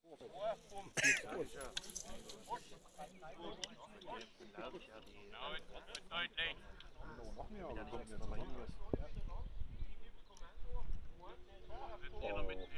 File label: iphone